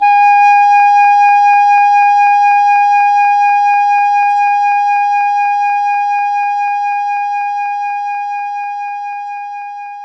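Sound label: English Horn G sharp 4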